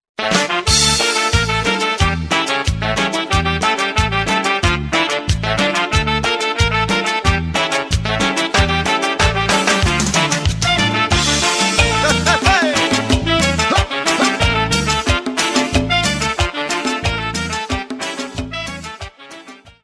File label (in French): Cumbia - Israel